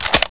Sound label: sniper mag in